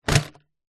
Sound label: office felt tip pens pencils other stationery in plastic tub set down wood surface; zapsplat